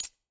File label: volume scroll release; premade voice